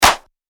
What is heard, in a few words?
Clap